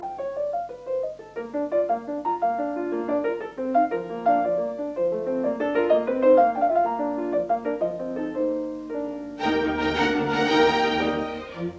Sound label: Piano Concerto in B flat major Allegro; Mozart; No 27; Op 17